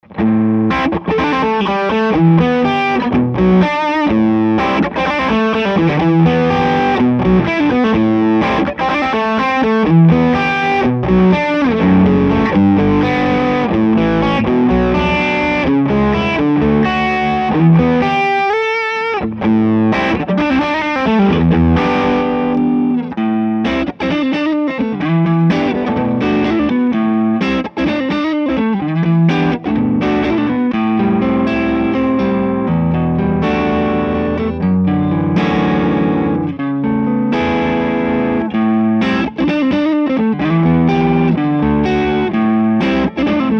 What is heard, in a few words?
Blackhawk HP drive